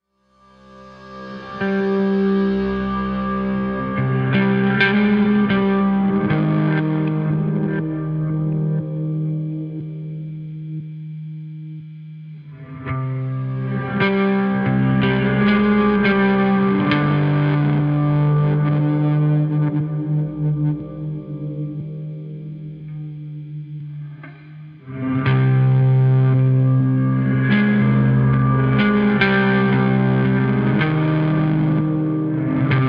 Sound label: opening sting; transistor